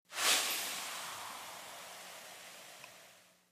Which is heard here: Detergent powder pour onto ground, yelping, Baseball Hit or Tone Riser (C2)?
Detergent powder pour onto ground